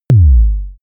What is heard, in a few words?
sub kick short